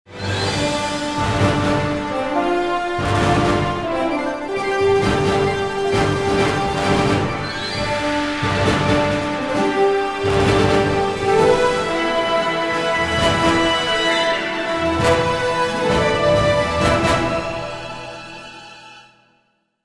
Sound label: Match Win; ratchet clank full frontal assault qforce